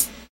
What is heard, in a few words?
crunchy hihat c key; hat samples